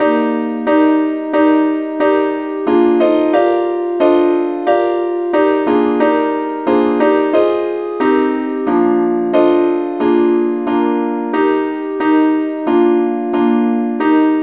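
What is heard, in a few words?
advanced lead, key E flat; SAINTTHOMAS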